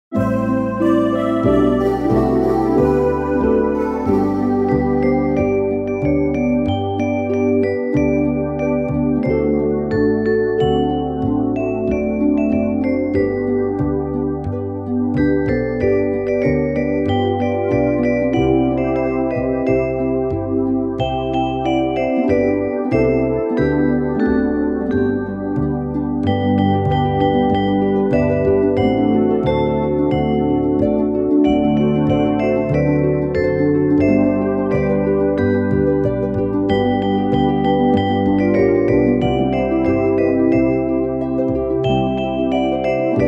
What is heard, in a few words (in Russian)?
музыка